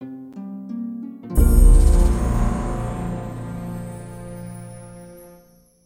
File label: Success Sting; Jurassic Park The Game